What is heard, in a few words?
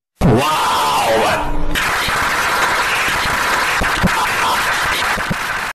woow claps